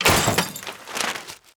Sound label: Train Station Newspaper box; pick N Click Scene; netent